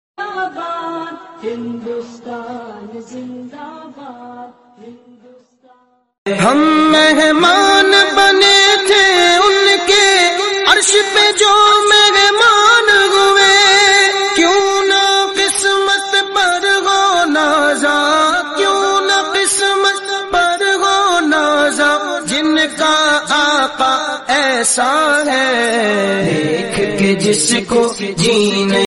naat ringtone, islamic; by sunni organization

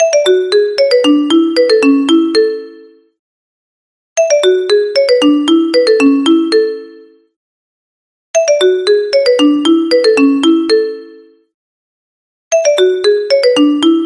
trending classical mobile tone ringtone

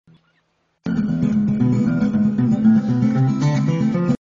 spanish guitar ringtone

